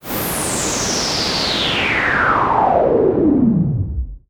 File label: Swept Noise